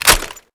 pkm cover down, weapons

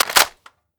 g3sg1 clip in, weapons; svt40

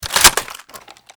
pkm bolt, weapons

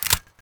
m4a1 deploy, weapons

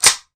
bolt back, weapons; g36

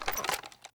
pkm draw, weapons

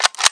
awp bolt back, weapons; aw50

